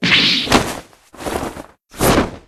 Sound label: stab reveal; breakdown